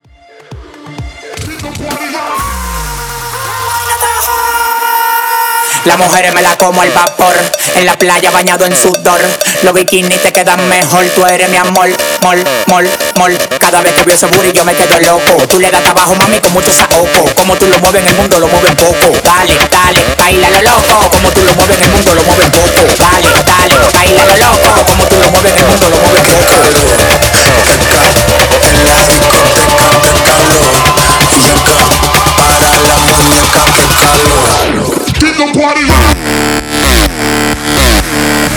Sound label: Bootleg 128 BPM